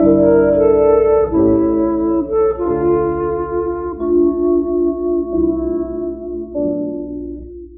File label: flute serenade, Loops, Sad